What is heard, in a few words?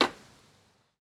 tap